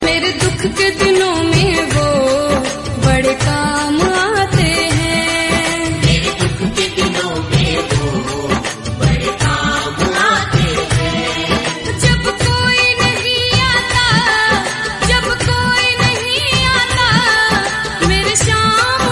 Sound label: Bhajan Ringtone; Khatu Shyam Ji